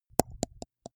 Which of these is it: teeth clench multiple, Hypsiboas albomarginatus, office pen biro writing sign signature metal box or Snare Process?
teeth clench multiple